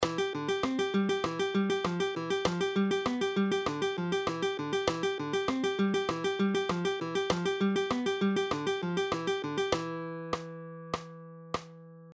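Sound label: Alternating bass Fingerpicking, Guitar Plucking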